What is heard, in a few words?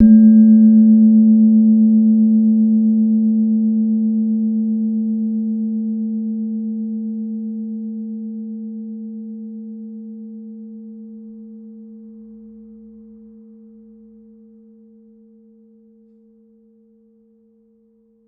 Slenthem, Gamelan